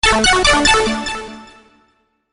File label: funny message tone; ringtones; sms